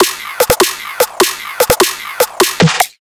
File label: 150BPM Drum Fill; Antidote Advent; Infected Track Drums Ableton Project